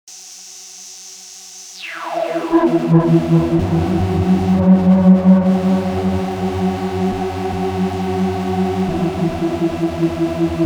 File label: Pad